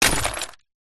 impacts glass small object smash break hit on cardboard